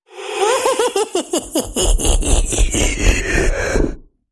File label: Leprechaun Laugh; Say Cheese